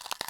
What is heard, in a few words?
Paw twitch; Phasmophobia